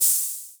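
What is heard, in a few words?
Olson Cym A, cymbal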